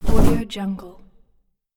electric game skill sound

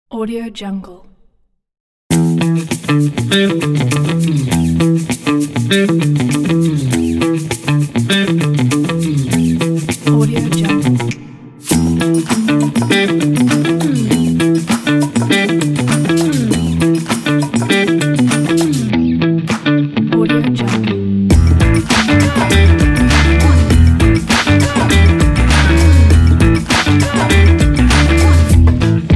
funky beat intro

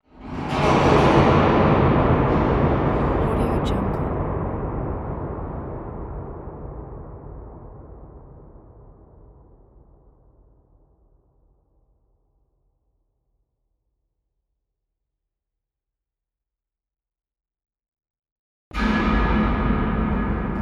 large powerful noise

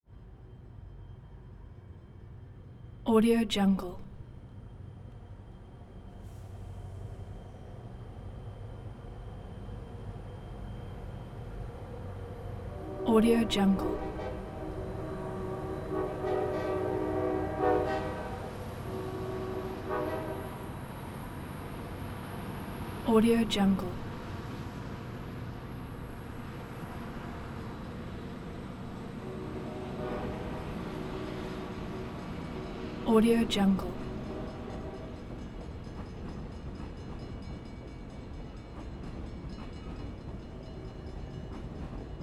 freight train

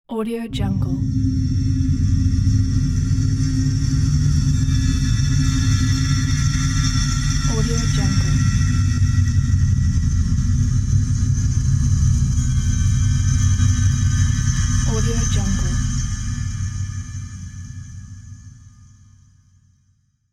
outer space sound

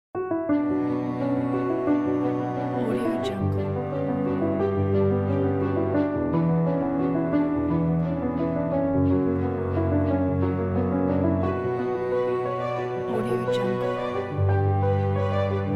dynamic dramatic orchestra

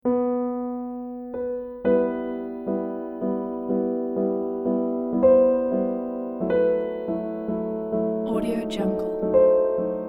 piano prelude in e minor; chopin piano prelude no 4

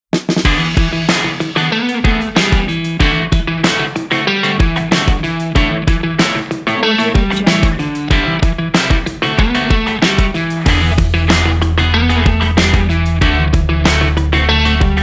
summer sport

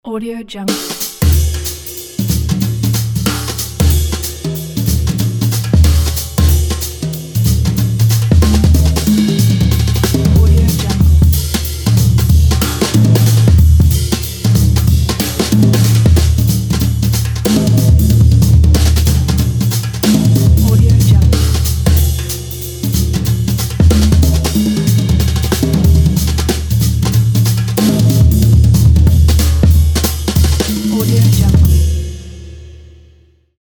drums solo

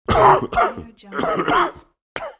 man coughing

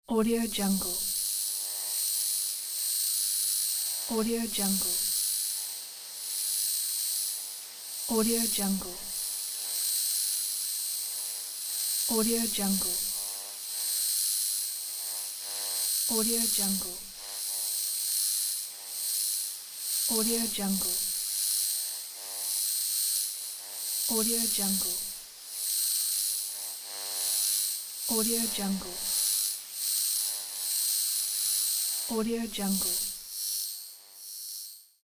power lines

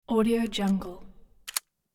switching off safety on rifle

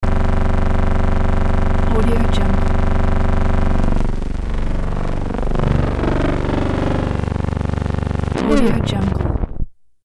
film projector reel ends; soundfxgarden

